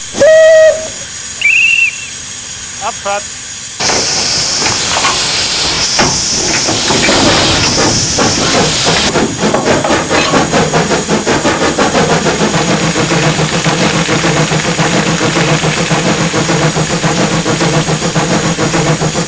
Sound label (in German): Hoerprobe Mh3